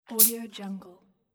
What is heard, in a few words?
small air freshener spray; mountainaudio